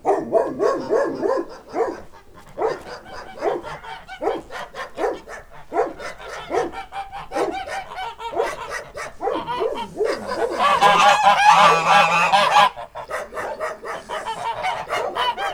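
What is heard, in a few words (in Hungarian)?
este a falusi udvarban kutya liba kis család; parasztudvar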